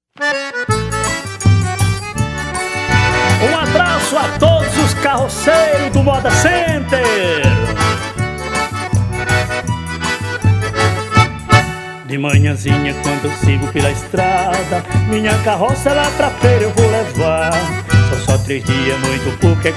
jingle; homenagem aos carroceiros

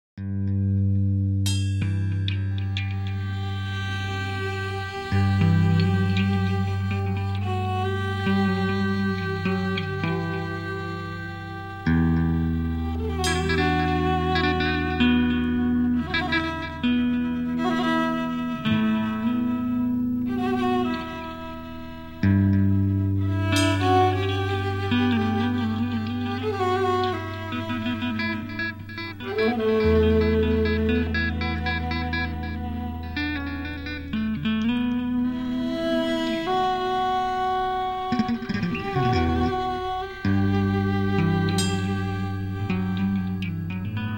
noise jam

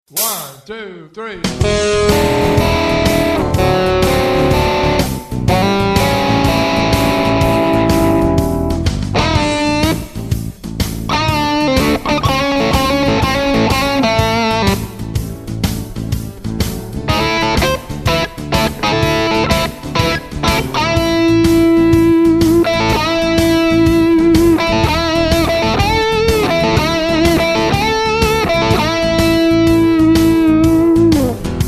blues jam

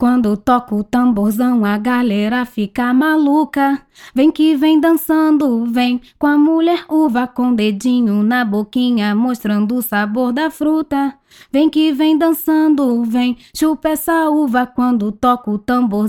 acapella melody

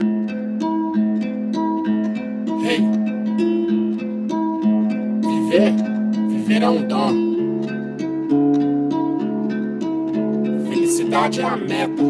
rap intro

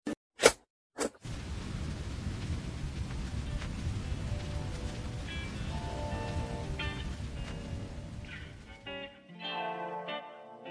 lofi type beat

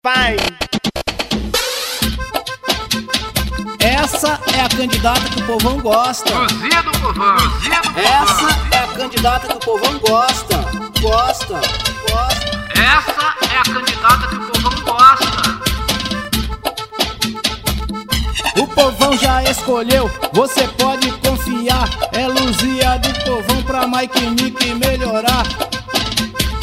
jingle

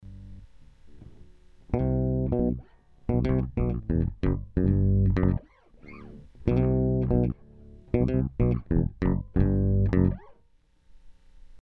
bass octave

